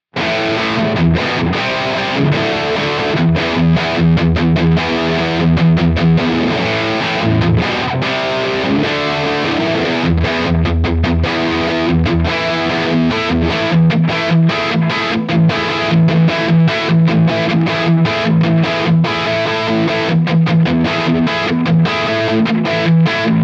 Rhythm; In Your Face

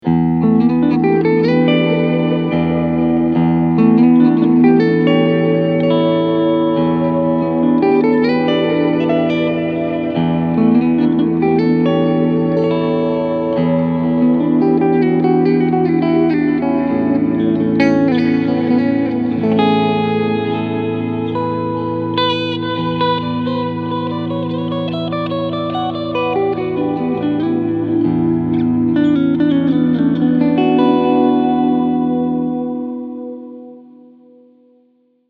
Reverb; Matthews Effects Astronomer v2; Canis Major

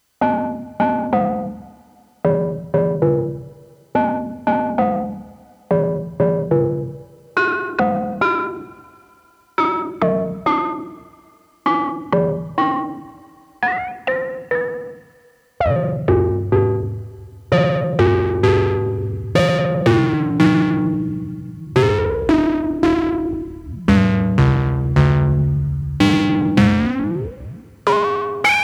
OB-1 Crossmodulation

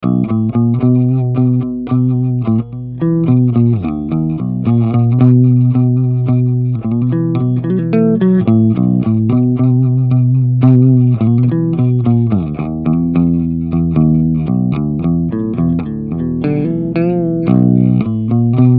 Ampeg; Warwick Rockboard

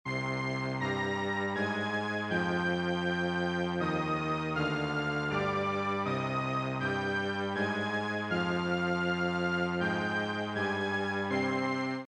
Solina Dry

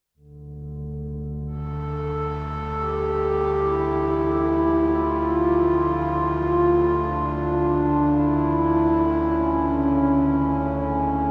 Sh-4d Model Sh 101 Noisy Pad

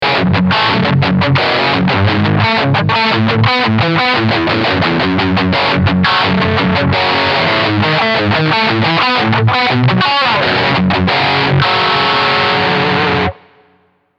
ibanez js140 wh

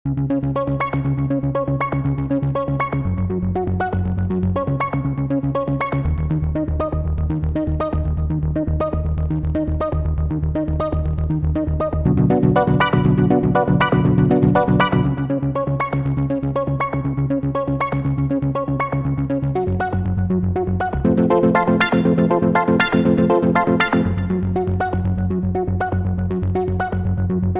Arpy Darpy; Magellan 2

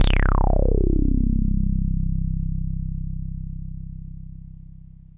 Waldorf Pulse Reso Synth

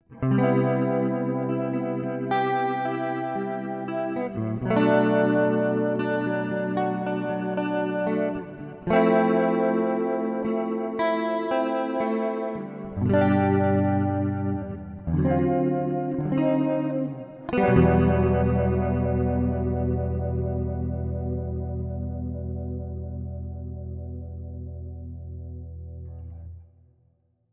Guitar Rig 7 Vintage Vibrato Maximizer

